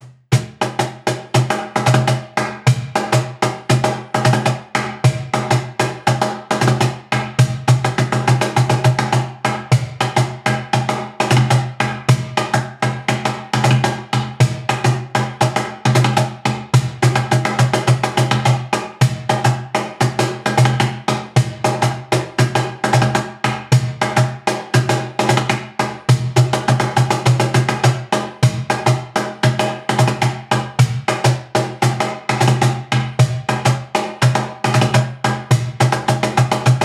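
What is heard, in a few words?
Bouncing Hall, Drums; Drumtastic vol II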